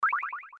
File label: zoom